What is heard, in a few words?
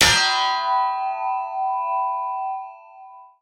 command block clang